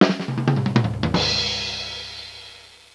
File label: drums